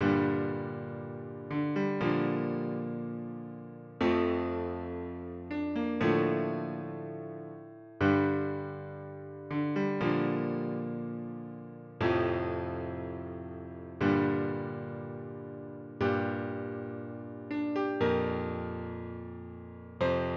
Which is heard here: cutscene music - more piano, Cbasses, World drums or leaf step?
cutscene music - more piano